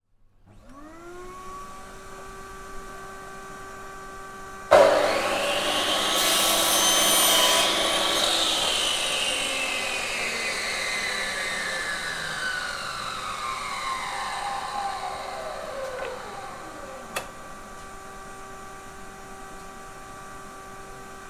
industrial power tool circular saw on operate short off